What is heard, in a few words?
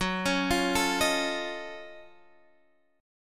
Gb Minor Major 13th Gb down Guitar Open G